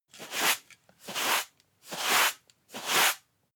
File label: broom sweep hard sequence